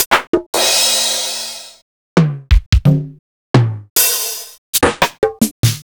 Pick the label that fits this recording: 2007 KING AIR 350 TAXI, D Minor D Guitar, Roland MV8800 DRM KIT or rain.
Roland MV8800 DRM KIT